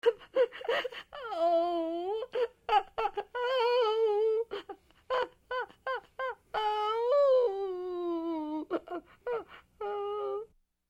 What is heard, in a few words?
Woman crying in help